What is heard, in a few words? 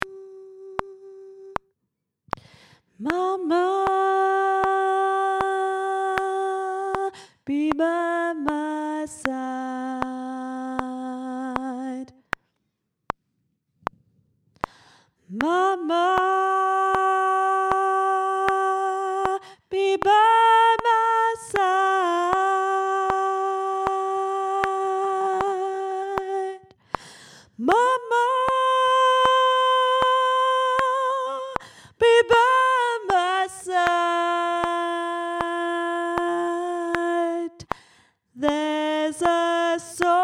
alto; soul wind